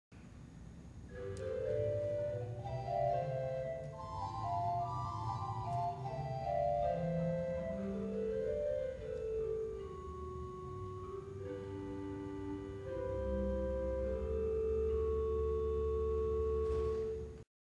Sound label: Rohrflote 4', organ